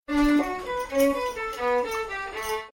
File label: violin